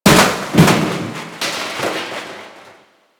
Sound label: Shipyards Metal Debris; Warframe; Grineer Shipyards